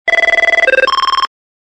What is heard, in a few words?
avaya ringtone